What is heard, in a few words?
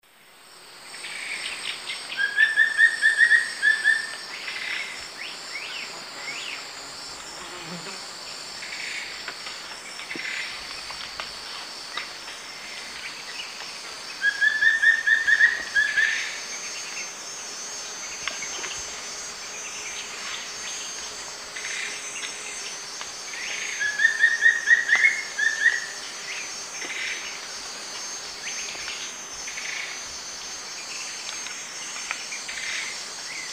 Piprites chloris, song; S. America; Eastern Lowlands